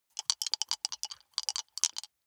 big two rattle in hand closed

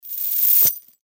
chains dropping

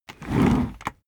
office chair worn trundle fast

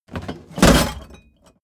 box containing metal drop

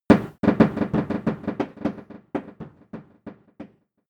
hit confused

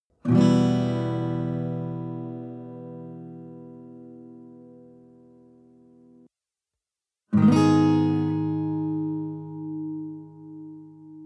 western guitar chord full minor

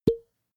potion small open

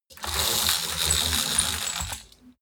roll-down curtain roll down

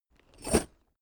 backpack metal single

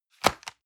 envelope drop multiple hard

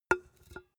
empty can put down hard

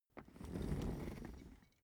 office chair trundle slow

